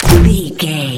gun grenade launcher shot

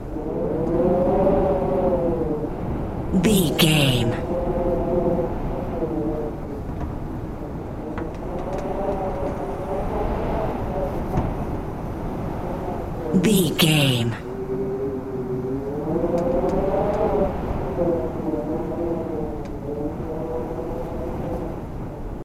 wind cold howling haunted loop

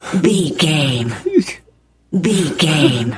voice male b cry whimper high

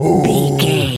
gorilla angry

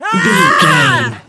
voice female battle shout

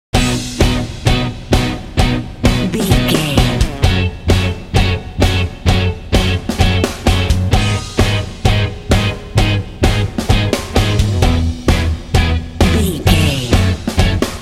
indie geeks bumper

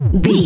retro misc bass sound

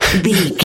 voice male breath loop single

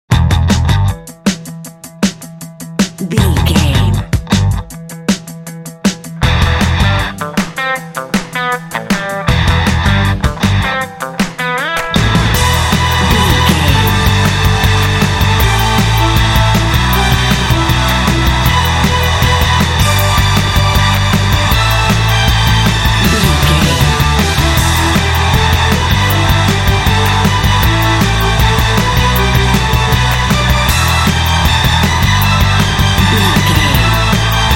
epic sports rock

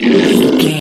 troll monster hurt pain long

